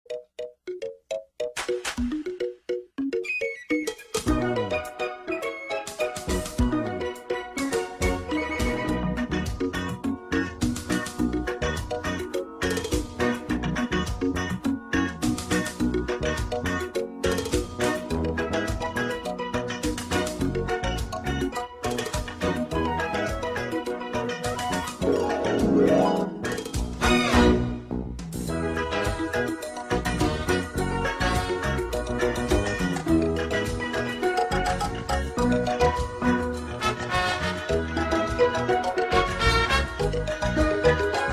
Instrumental karaoke; La sirenita

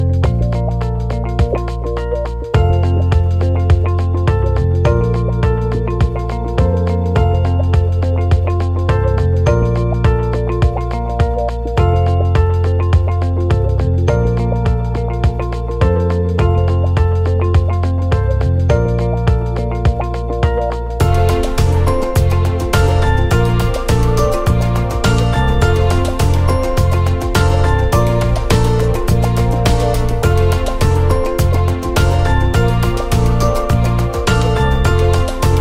Música de fondo para presentaciones videos corporativos tutoriales

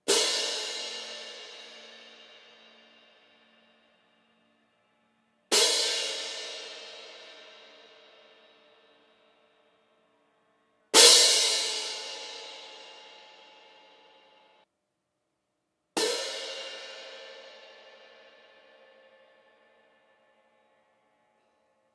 Platos de Choque

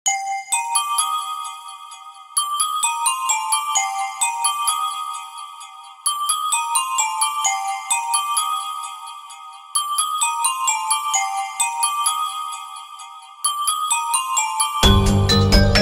Suspenso Sin Copyright Libre de Derechos

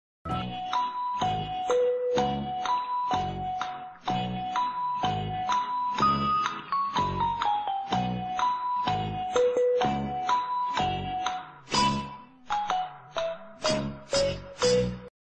MÚSICA DE DUDA Efecto de Sonido

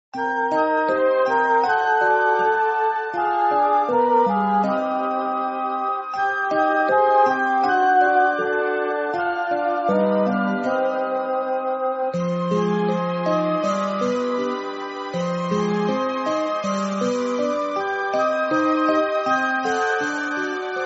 Música Para Halloween de Miedo Misterio Terro

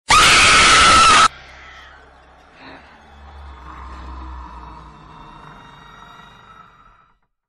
grito de la llorona

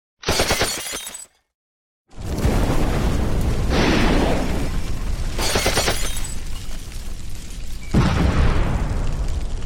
Efectos disparos fuego y explosion

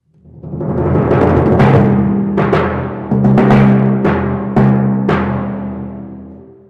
Timbales

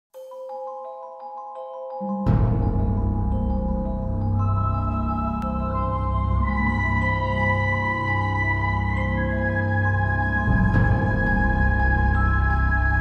MUSICA RELAJASION DE LEONES

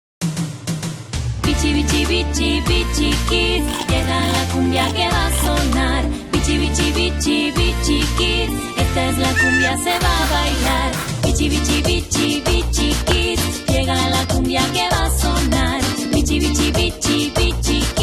la cumbia

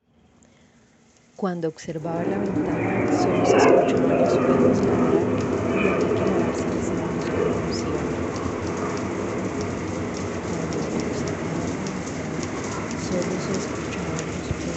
cuatro frenetico

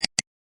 Click de mouse efecto de sonido

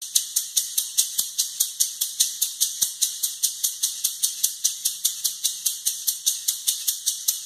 Sonido de las maracas

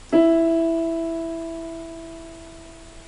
tecla piano

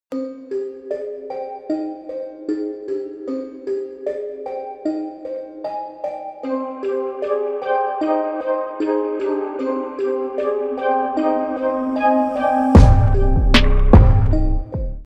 intro de terror sin texto

